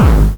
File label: Bombo Hardcore, kicks